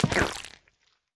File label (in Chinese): 蜂蜜块踩踏声